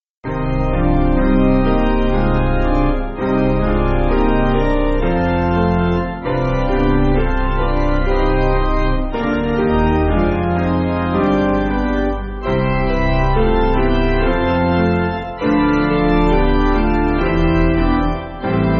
Woman In The Night Piano

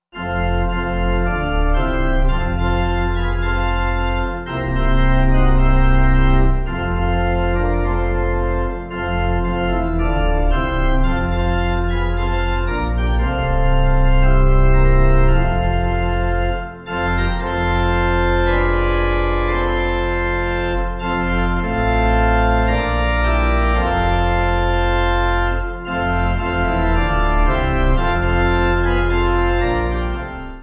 Pipe

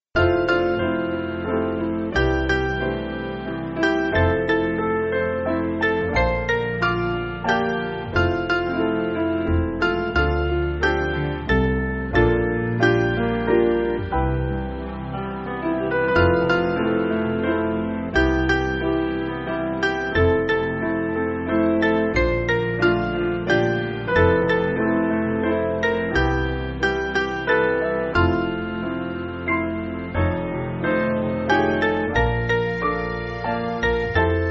Piano

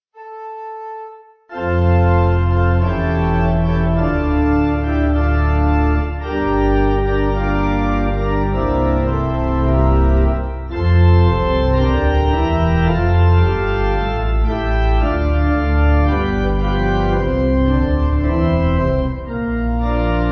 Pipe

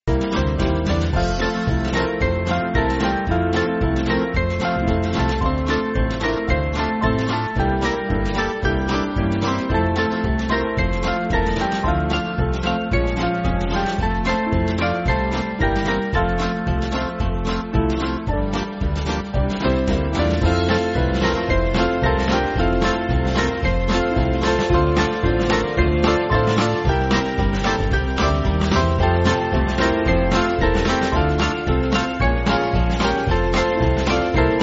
Band

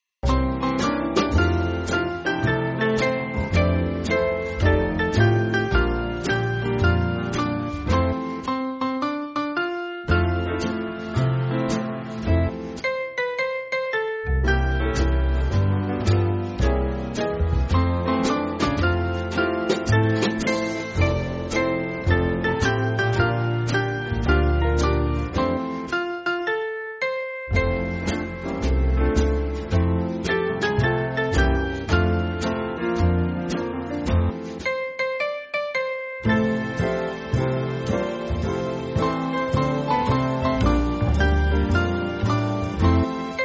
Band

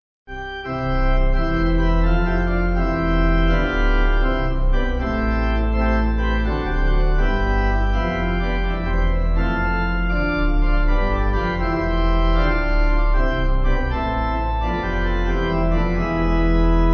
Pipe

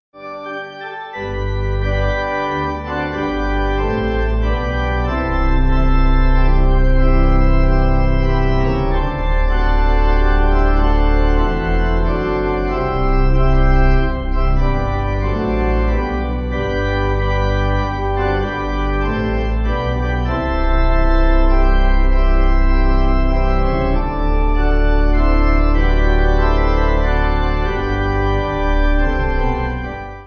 Pipe

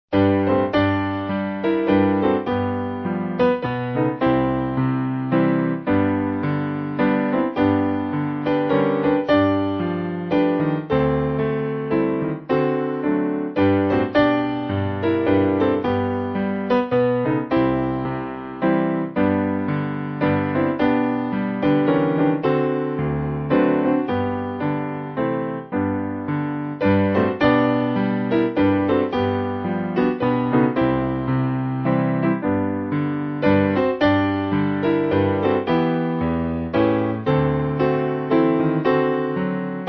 Piano

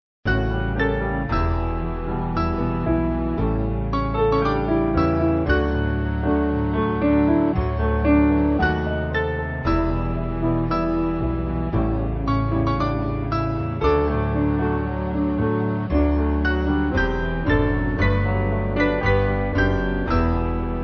Piano

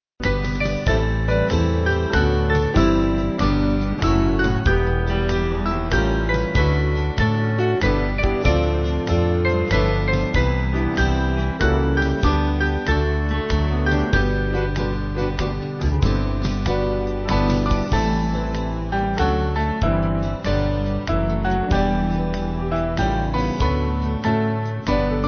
Band

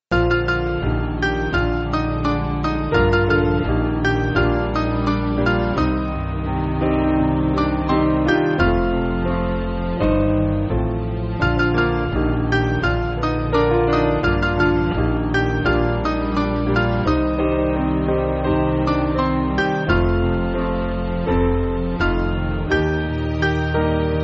Piano

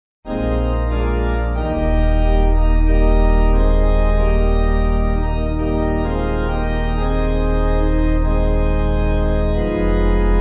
Pipe

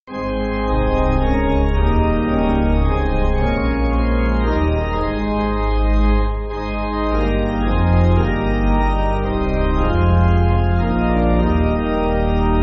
Pipe

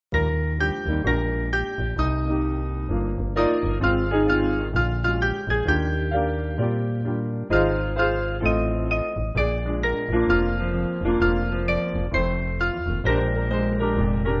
Piano

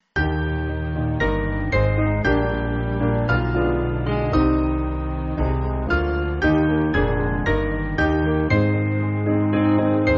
Eb Piano

